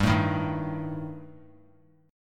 G Suspended 2nd Sharp 5th; Gsus2#5 strummed; JGuitar

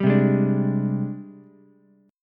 Ab Major Sharp 11th Ab down Ukulele C6; AbM#11 strummed; JGuitar

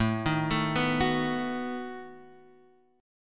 B 7th A

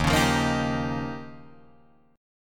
Eb 9th, Guitar, Drop D

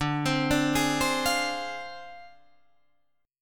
D Minor 7th Flat 5th Guitar Drop D

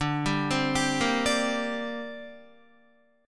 D 7th Suspended 4th Sharp 5th, down, Guitar; D7sus4#5 arpeggiated

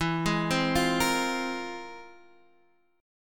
F Minor Major 11th

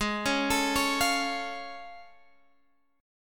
A Augmented Major 7th G sharp

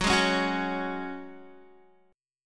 F sharp Suspended 2nd, Guitar; strummed; JGuitar

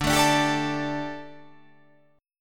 D Suspended 4th D

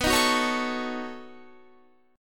B 9th B down Guitar; strummed

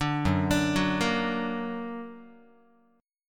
Gb Augmented Gb Guitar; arpeggiated; 4A,4E,5A,5D,5G#,6C#

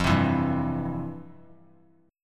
F Major 7th Suspended 2nd, F, down, guitar; strummed; JGuitar

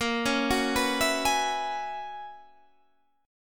Gb 11th Bb

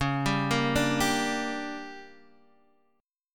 D Major 7th Suspended 4th Sharp 5th, chord

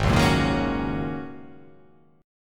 G sharp 9th Suspended 4th G sharp

